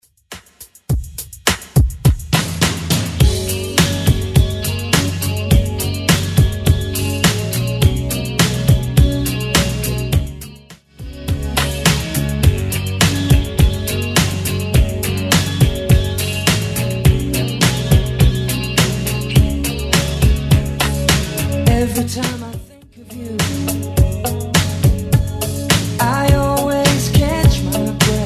80s Simple Redrum 104bpm

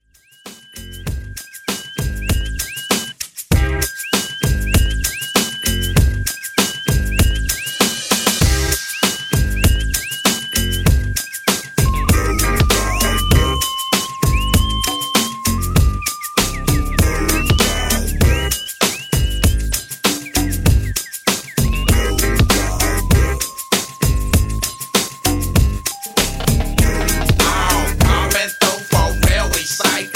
98Bpm; Redrum

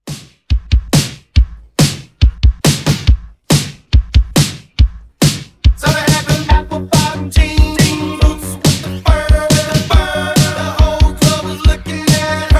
Re drum

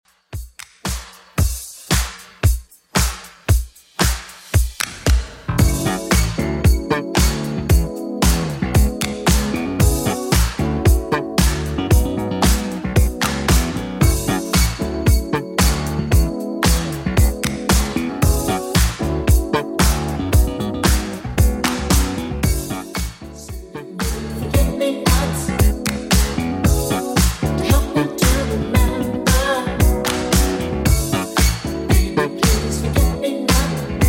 80s Redrum 114bpm

